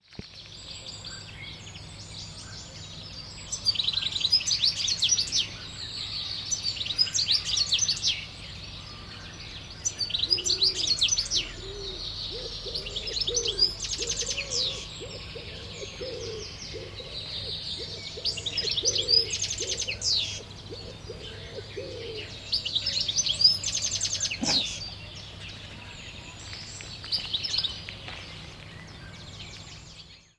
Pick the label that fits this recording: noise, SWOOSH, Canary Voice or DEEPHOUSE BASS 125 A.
Canary Voice